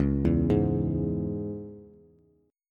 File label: C sharp Minor C sharp Bass, chord; arpeggiated; x,4,2,1; JGuitar